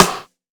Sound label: Rimshot, Snares